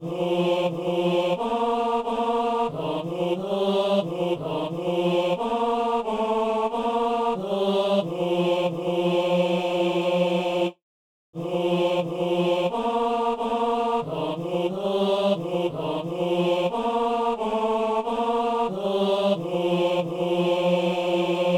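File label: tenor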